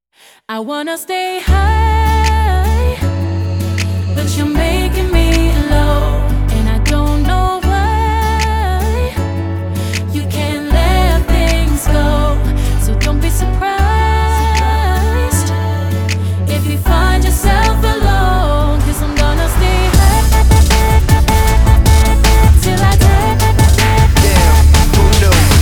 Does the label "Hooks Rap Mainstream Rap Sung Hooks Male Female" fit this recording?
Yes